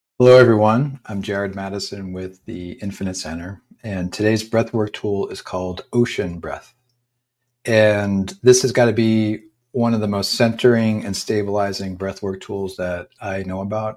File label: Ocean Breath